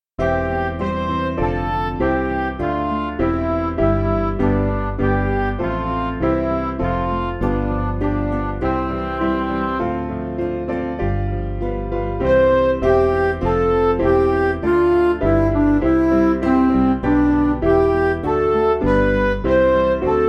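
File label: Music Backing Files for Churches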